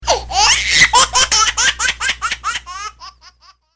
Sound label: giggling baby